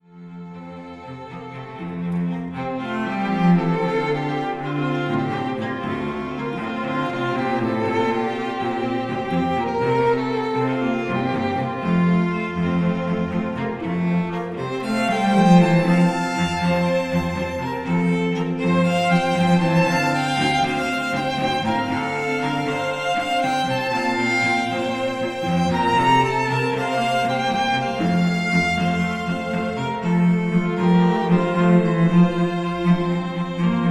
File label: String Quartet